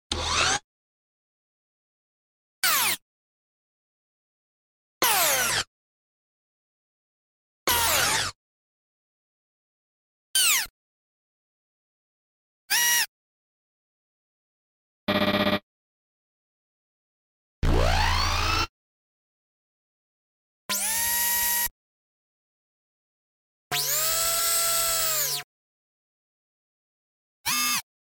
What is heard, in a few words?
Robot Engine